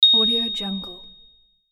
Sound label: shine ding